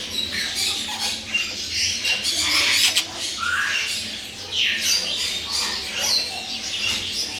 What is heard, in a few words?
birds farm; best service Blue Box